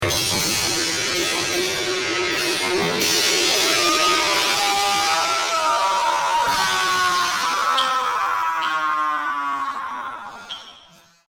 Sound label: big gong ahh ringtone